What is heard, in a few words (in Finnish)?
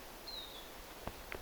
tiijy vihervarpunen